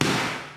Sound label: bomb splosion; Gachitale